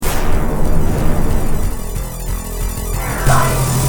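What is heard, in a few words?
Lightning Wheel Music, Williams pinball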